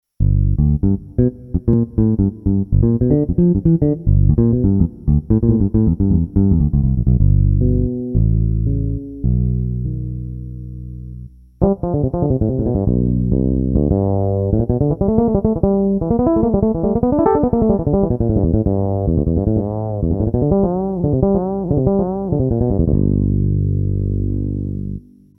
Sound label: bass; IK; iRig; SampleTank iOS